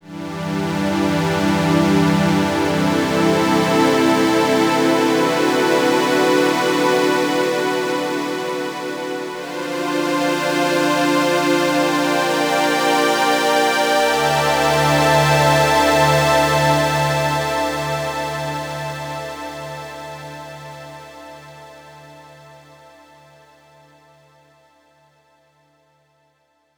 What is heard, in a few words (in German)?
synthesizer strings schwebungen